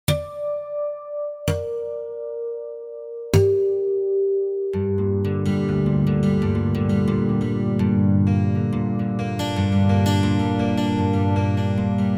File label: Velo Harmnix, Roland JV-880; Factory Patches